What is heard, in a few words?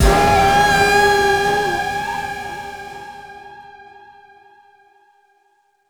Sting; Stronghold